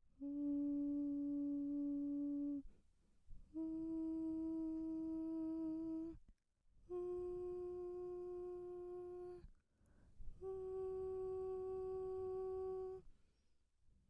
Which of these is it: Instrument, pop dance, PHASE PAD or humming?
humming